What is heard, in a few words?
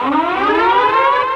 ALARM